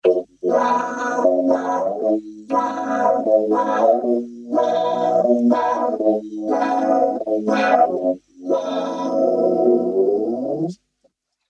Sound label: SVC-350 Guitar Chords